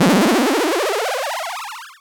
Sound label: highscore beaten; deckentetris